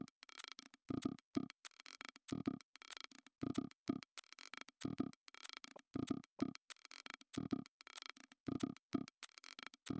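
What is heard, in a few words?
with drums, instantaneous mixture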